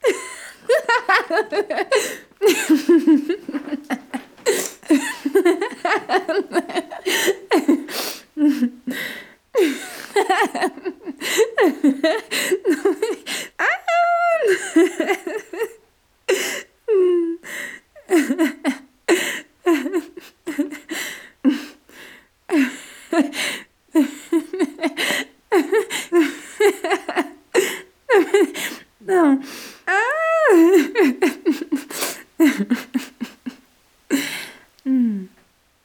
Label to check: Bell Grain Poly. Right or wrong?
Wrong